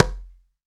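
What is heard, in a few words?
conga low closed; STEREO CONGA; Cuba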